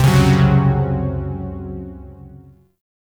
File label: Shawzin Hirajoshi Chord; Warframe; Zariman Shawzin